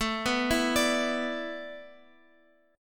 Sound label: A Suspended 2nd Suspended 4th, Guitar, Open F; Asus2sus4; arpeggiated; x,x,9,6,7,9